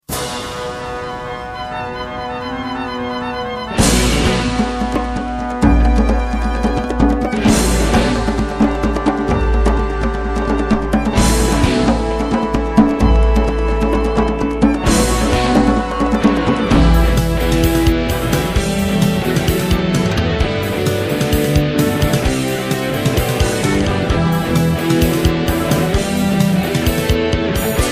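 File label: synthesizer percussion electric guitar bass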